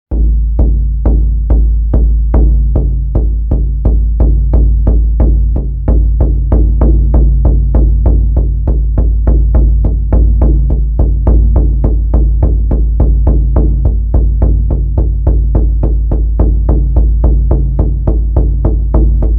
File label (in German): Schamanische Trancemusik